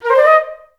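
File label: flute pickup D#4; Best Service; Peter Siedlaczek's Advanced Orchestra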